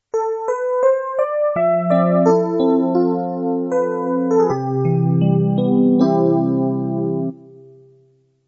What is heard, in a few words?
jingle